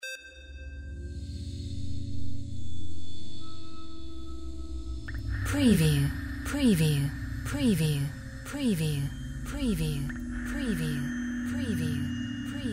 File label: drone river of tones drip all fx